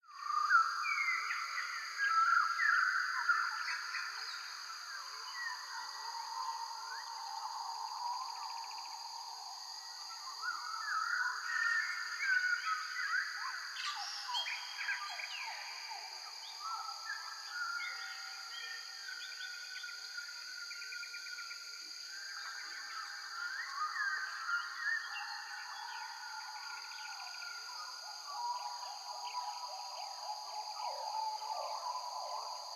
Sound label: Jungle Night Atmosphere - Night Bird Songs, Light Crickets; Hollywood Edge; sound designer's toolkit v2